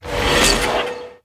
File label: e skill explo cast